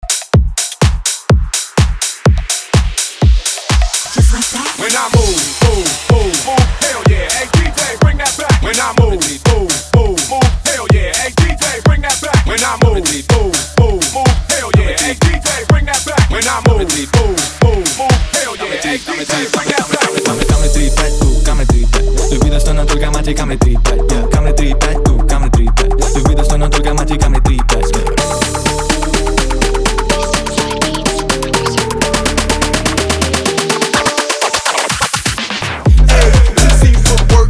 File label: Break 124 BPM; Tools 4 djz